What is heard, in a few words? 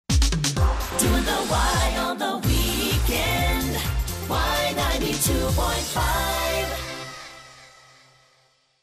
jingle weekend